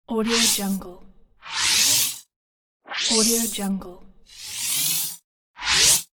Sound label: scifi game power up item collect